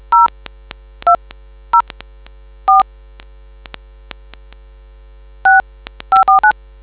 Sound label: dtmf